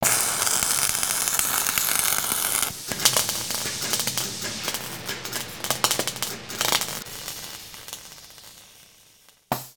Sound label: welder WELDING; foley